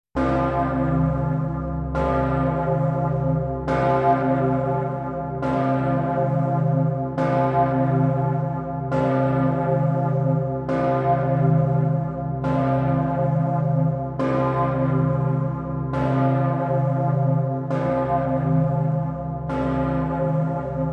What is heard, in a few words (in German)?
Pummerin